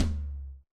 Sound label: RX8 Tom, Yamaha RX-8